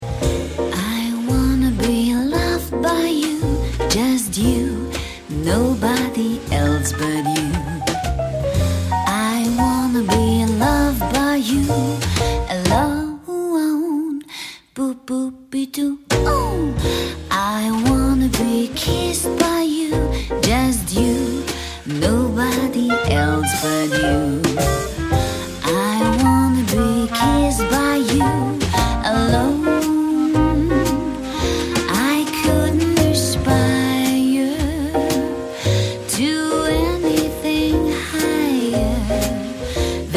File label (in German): gesang